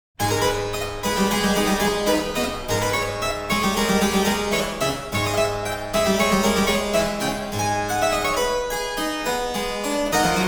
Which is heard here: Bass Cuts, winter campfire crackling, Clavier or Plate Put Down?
Clavier